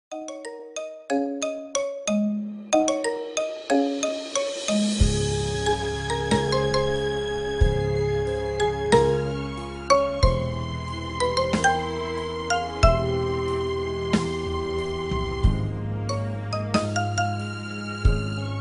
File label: marimba remix ringtone